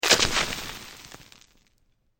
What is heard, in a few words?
attack dirt hit, Frostcaller; RYME